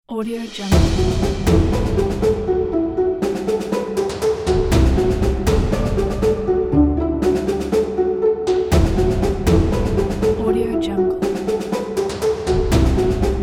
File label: heroic epic orchestral theme; dimantasshutas